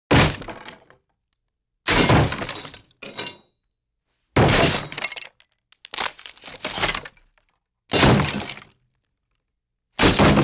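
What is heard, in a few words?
impacts axe multiple wood; Asian Show Sound Effects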